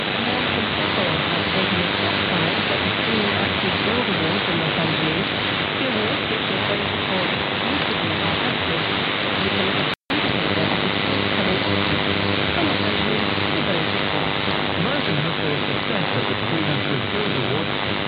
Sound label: 1368khz BBC AM